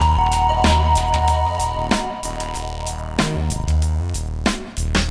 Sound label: Glacial Groove, ringtones; BLU Studio-XL-2